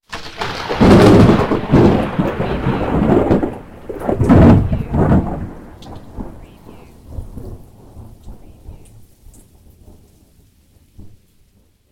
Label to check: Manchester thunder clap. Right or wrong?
Right